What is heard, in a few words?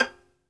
SOLO VI, Synclavier Strings; ILIO